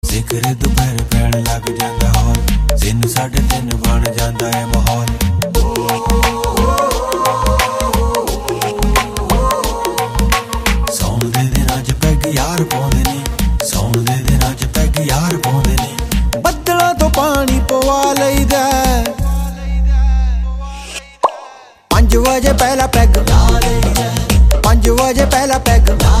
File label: Punjabi Ringtone